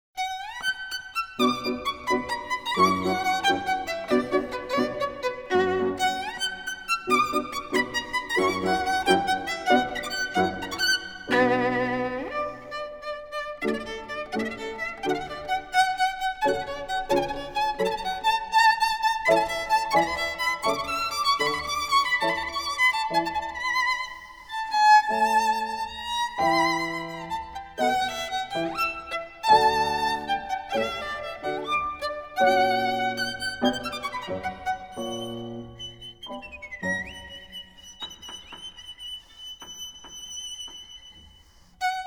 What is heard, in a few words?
violin and piano; Paganini; La Campanella